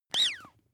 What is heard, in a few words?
bath duck release